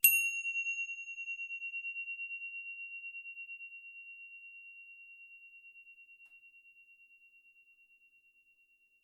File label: tibcymb distant weak sound